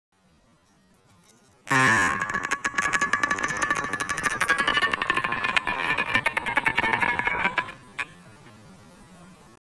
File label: Majungasaurus, Dinosaur Roars